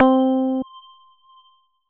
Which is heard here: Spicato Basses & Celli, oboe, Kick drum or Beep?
Beep